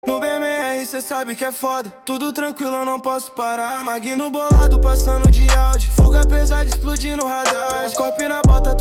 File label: music